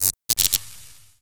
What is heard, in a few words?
fizzle